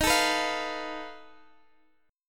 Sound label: F 7th Flat 5th D sharp